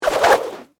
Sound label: Cable Swoosh Wire, Foley, Cable, Swoosh, Wire, Whip; Sound Effects:Objects